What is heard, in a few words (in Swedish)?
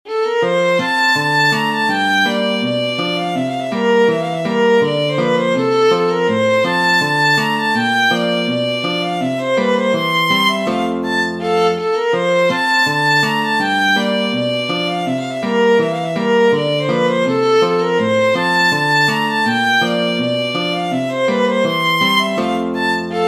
Schottis